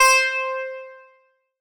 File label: Oberheim OB-X PLUCK Narrow Pulse - C; One Shots; Cymatics; Fantasy Synth Sample Pack